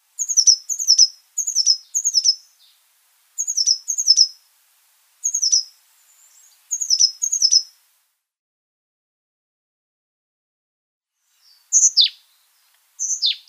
Tenerife Blue Tit song

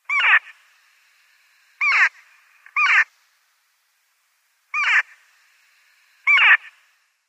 Daurian Jackdaw

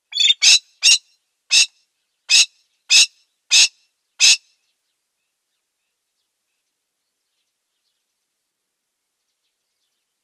Golden Pheasant male display

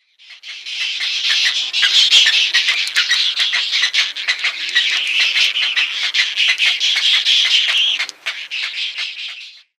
Little Egret nestlings

